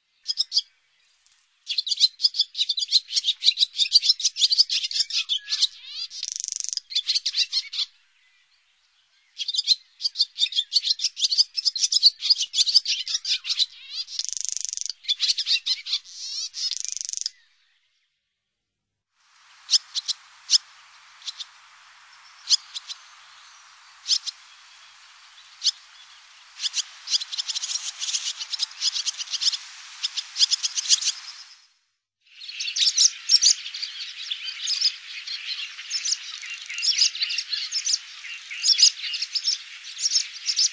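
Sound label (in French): Hirondelle rustique, oiseaux; birds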